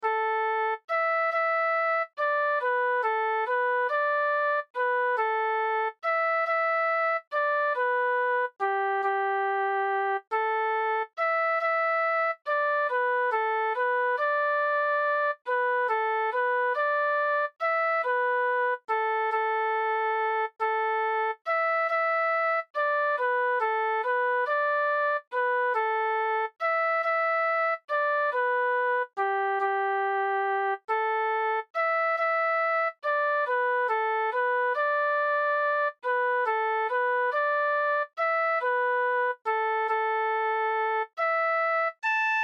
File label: slide